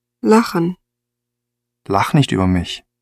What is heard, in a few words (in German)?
lachen